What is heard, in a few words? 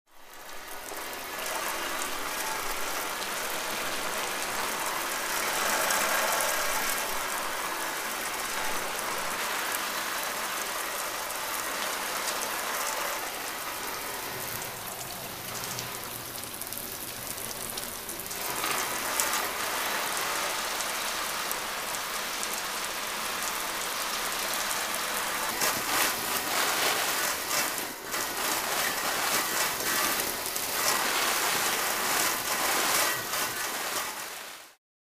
Indoor Fire Sprinklers Spray Water On Metal Surface